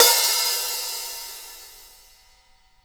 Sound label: Hi Hats; InVision Interactive; Lightware VOL-1